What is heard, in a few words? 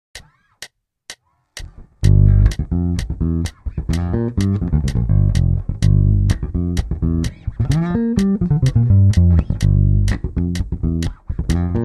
Minor pentatonic fill in G